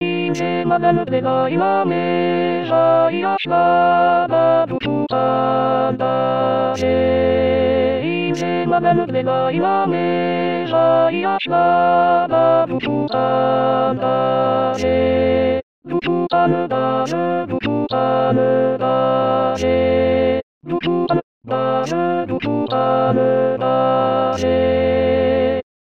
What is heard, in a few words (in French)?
Alto